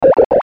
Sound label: Mouseover Warble Multiple Short; Multimedia; Blastwave